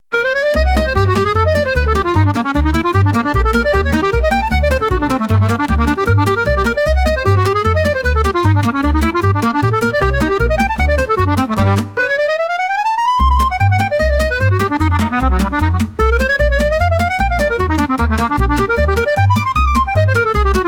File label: Accordion